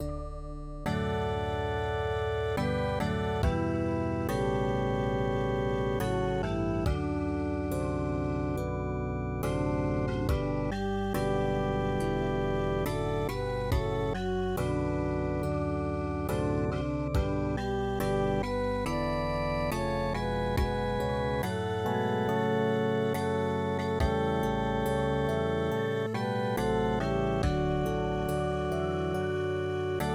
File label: National Anthem Czech Republic, midi